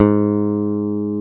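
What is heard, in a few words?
G#2, Bass; Best Service Gigapack 2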